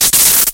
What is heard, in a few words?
Energy Ball